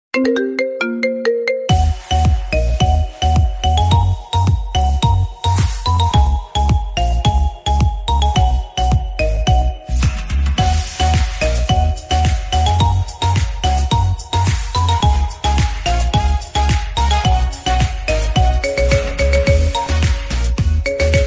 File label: Marimba Remix